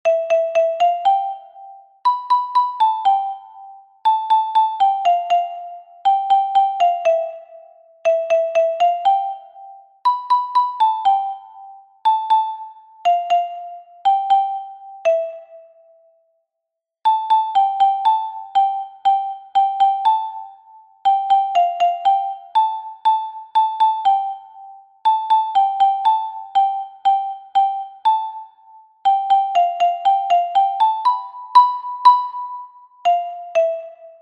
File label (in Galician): Xilofono Soprano